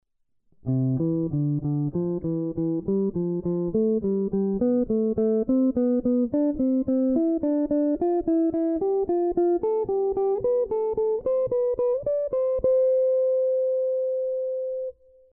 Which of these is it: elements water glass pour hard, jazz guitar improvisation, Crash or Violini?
jazz guitar improvisation